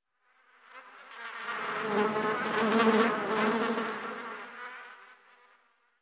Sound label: insect fly; Call of Duty Modern Warfare